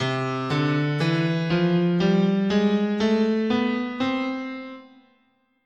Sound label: C Bebop Major, scale